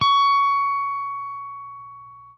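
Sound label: jazz line, guitar; Quantum Leap - Guitar & Bass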